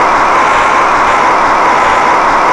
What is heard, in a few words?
scrub dry; Kart